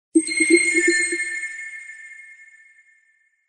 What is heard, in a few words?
freeze ringtone